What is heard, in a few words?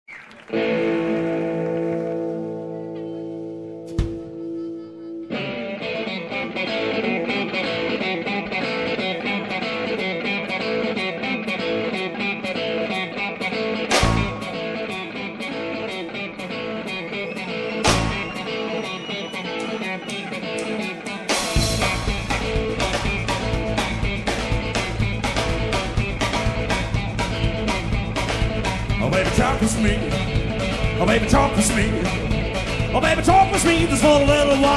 modem; Baby talk with me